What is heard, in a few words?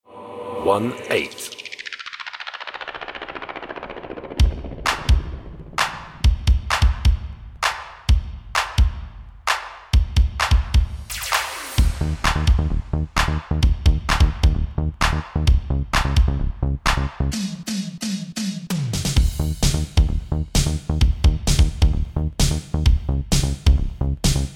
old skool cheesy 80s theme; Deadwalk Designs